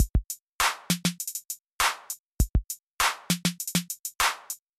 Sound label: 16th note snares; 拉丁陷阱音乐